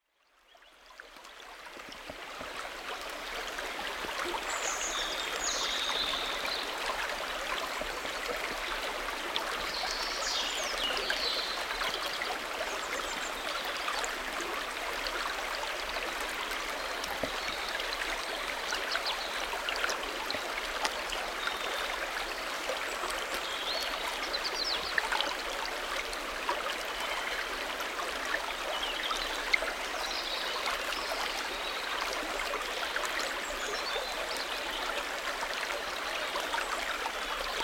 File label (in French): cincle